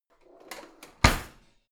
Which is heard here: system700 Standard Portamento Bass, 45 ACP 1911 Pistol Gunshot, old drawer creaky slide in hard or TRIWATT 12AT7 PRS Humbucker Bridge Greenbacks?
old drawer creaky slide in hard